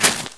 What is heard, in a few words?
dirt, footstep